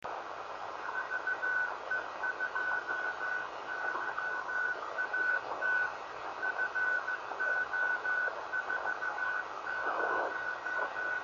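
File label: 50.054 MHz CW, ham radio beacon; VE2YAT/B in grid square FO40, northern Quebec, Canada; 50.054.4 MHz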